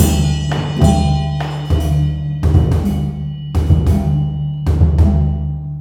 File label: MALAY R, Heart of Asia